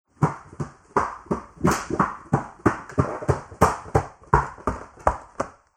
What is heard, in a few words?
go upstairs with slipper